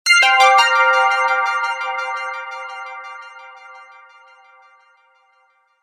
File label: Surprise Sms